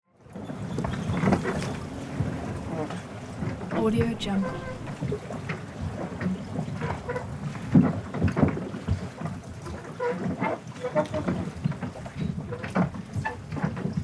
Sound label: boat dock with wood creaks